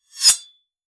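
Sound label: thin and sharp sword slash; high end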